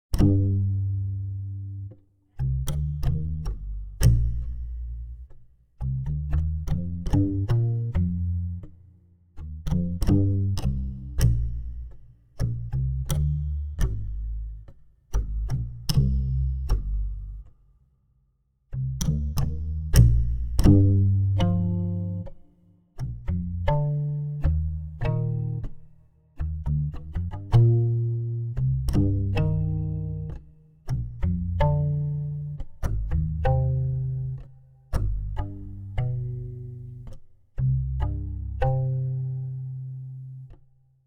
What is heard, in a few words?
demo contraviolin; schallenberg engineering